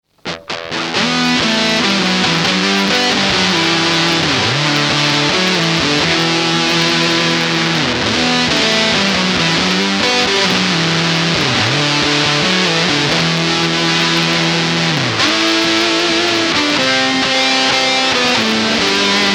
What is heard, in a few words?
Fuzz; circuit demos